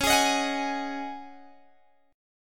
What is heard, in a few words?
Db Suspended 2nd Suspended 4th Db Guitar Open G